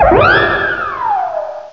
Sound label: Cry; Pokemon Essentials; Pokemon Iberia V1.03